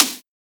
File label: Snare; Rancid; Psytrance